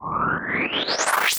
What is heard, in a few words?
Misc Short FX